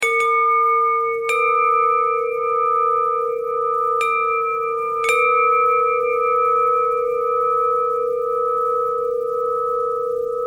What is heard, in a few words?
bell threads; bells